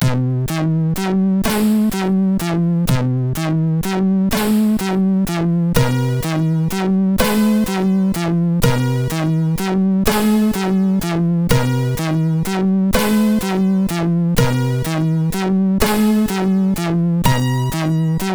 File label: sid MOS6581R4; Sky Lovers